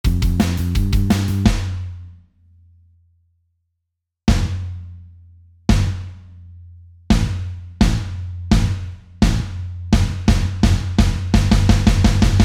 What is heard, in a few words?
Unmastered Backing